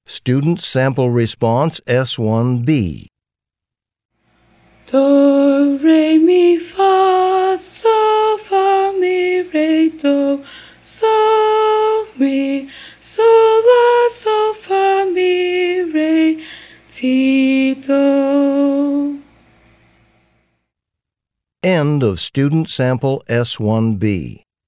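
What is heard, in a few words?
music ss2 sample, sightsinging; Sight-Singing Sample 1B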